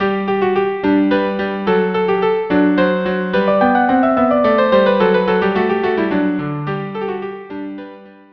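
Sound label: viola cello duo polonaise; KV 487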